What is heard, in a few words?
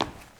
foot tile; Zomboid 41.78.16; Project Zomboid